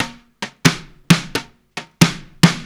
COUNTRY

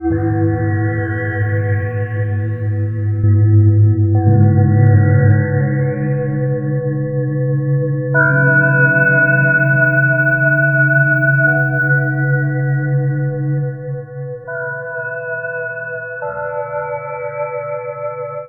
Spectral Tones; Sonic Foundry (Sony Creative Software); Loop Noir

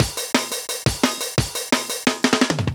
DNB BREAK; m8 breaks

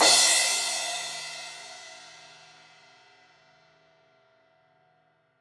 Crash 16in edge, Cymbals; Deeply Sampled Drums

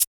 Roland Juno D Perc Menu Kit

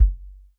REAL DRUMS POP KIT; Best Service ProSamples vol.43 - Real Drum Kits

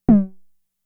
Tom, Toms; Fricke Schlagzwerg; Drum Machines

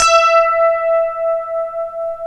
ROCK STRAT; E-MU Formula 4000 Series Vol. 3; Analog Odyssey

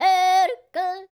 YODEL, World Voices; ILIO; Vocal Planet

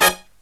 HIGH HIT; USB Soundscan vol.29 - Killer Brass Riffs; AKAI; HIGHHITS

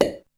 Perc; ZERO G - Datafile DISC 2

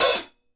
OPEN HAT, HI-HATS; Sampleheads; New York City Drumworks VOL-1; KD HI-HATS

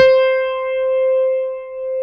CP70

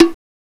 BONG HI, FAIRLIGHT CMI IIX; PERCUSN2

